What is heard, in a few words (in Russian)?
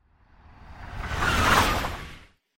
minomet